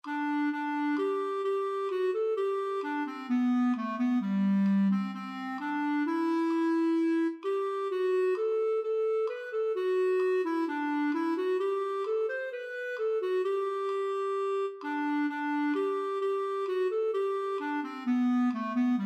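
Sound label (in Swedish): brudmarsch G-dur; Klovsjo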